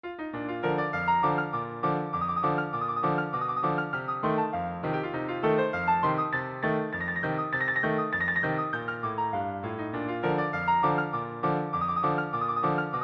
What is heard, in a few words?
polka, piano